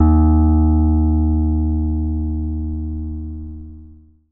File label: vibrate, Synths; Notes